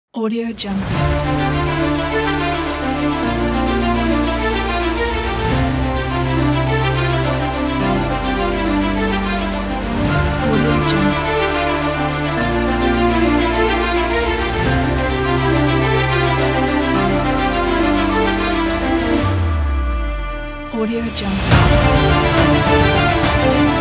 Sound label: epic; musicalliance